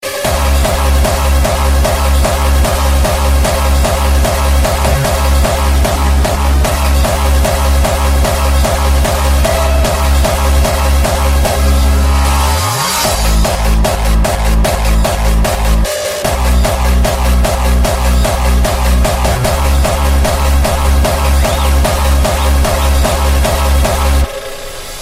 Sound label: electronic vibes